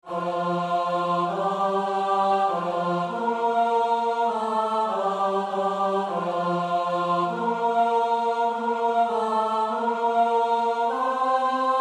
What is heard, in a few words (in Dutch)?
Psalm 121 vers 1 en 3 (S-A-T-B); Goudimel; Oefenbestanden